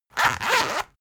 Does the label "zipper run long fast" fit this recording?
Yes